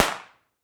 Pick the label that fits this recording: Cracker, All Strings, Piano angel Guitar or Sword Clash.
Cracker